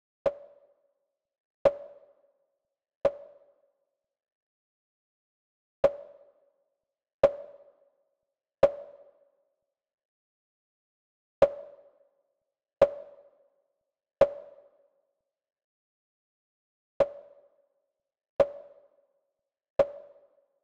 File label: SONAR